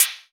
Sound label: HAT Noise; NoiseNoiz